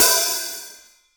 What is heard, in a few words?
OHAT, NATIVE HH